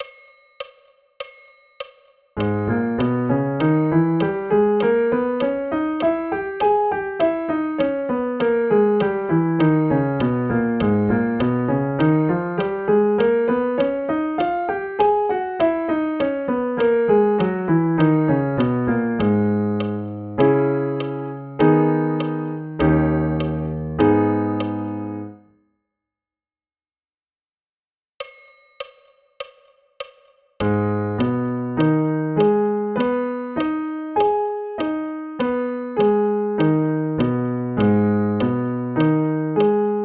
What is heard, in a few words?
G minor scale and arpeggio; bastien